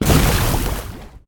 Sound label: basic fire; Smite; Sol